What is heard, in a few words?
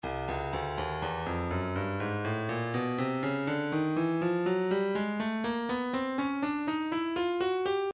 stylus breakbeat midi files 122 bpm; Germanic C